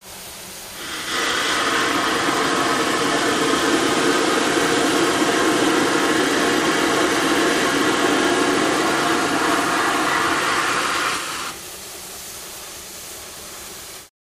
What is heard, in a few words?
Steam Blow Down Long, steam train